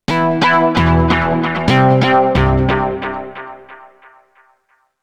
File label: Oneshot Synth